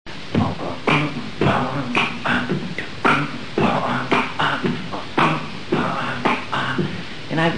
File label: beat box; court